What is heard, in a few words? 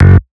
bass staccato; osu